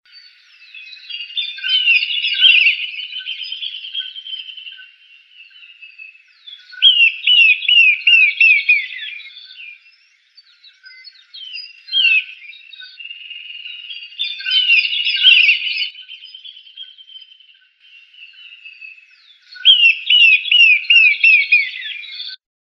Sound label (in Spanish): chinchero